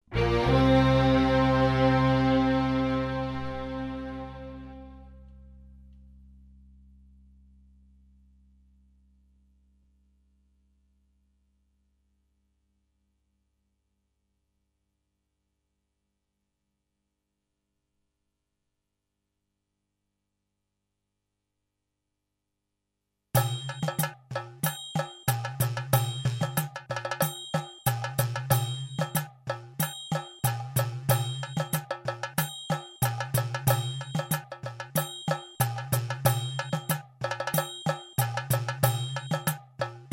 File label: Karaoke